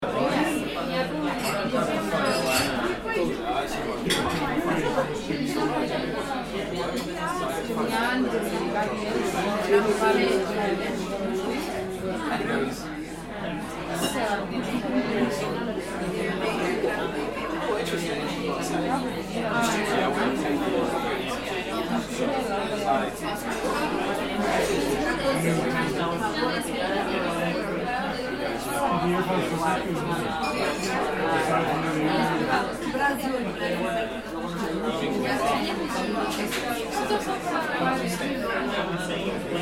Busy crowd voices background chatter; city; Urban, Town

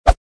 Quick swipe transition; shorts sound effects

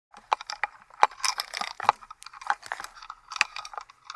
Camera case handling backpack

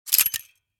Stapler stationery office handling

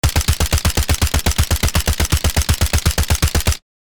Spec ops assault rifle full auto fire; war

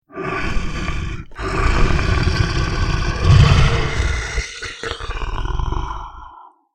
Bear growling and snarling; animal

